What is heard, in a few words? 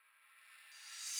reverse cymbals